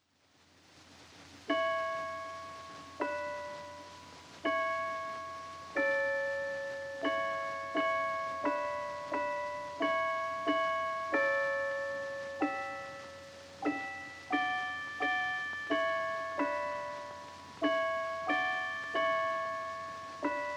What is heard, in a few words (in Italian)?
Campane a sera Ave Maria